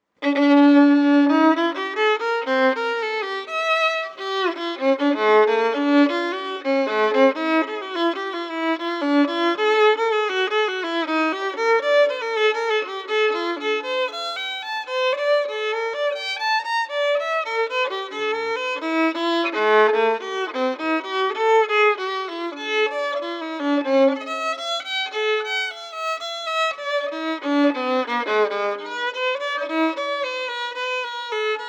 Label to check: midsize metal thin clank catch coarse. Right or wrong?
Wrong